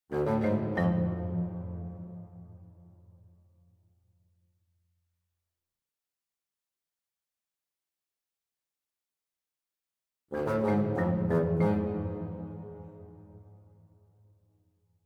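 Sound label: Cello Bassoon Marimba Melody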